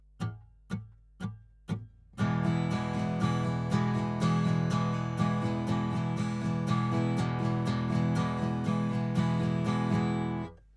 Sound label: Strum 120bpm